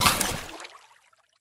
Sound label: marlin hurt